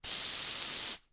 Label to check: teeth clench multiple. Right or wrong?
Wrong